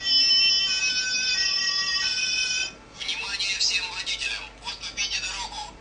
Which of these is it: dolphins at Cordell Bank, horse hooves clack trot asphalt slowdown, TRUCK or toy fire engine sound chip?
toy fire engine sound chip